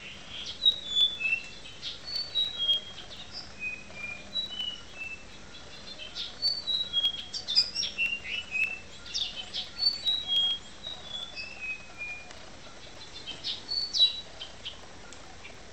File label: Common wattle eye bird